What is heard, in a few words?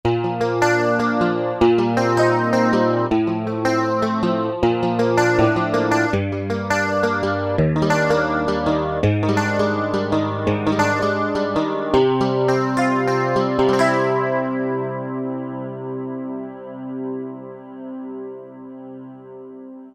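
guitar Ultra Sheen; Roland L-CDX-01 Rhythm Section Instruments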